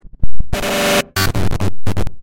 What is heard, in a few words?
90s warning